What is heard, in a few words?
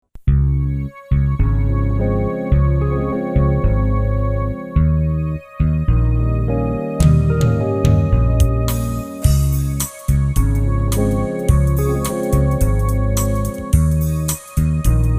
Chill China Glitter, Chill